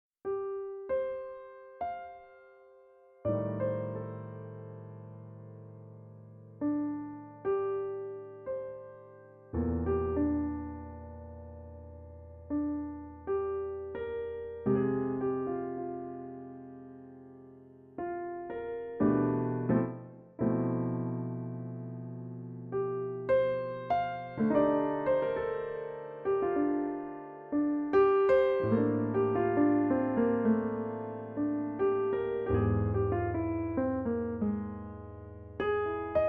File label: jazztpt Rain